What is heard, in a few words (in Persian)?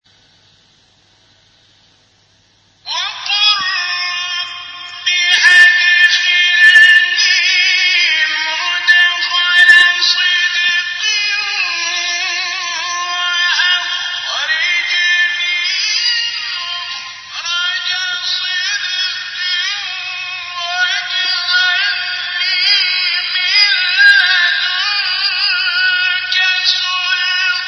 تلاوت آیه سوره اسرا; آیه 80